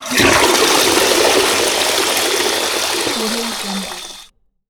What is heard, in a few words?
toilet flush